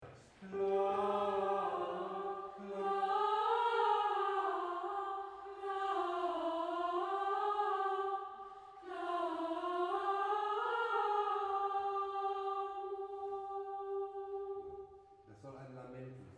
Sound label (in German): Anfang Sopran; Bikkembergs; Psalm 142